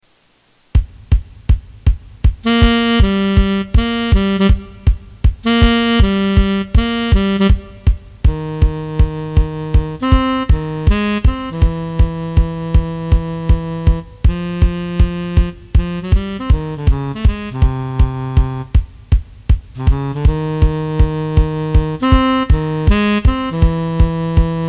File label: 160bpm Tenor Sax